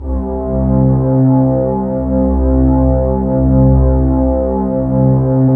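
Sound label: synth Morpheus; Sound & Vision - Gigapack II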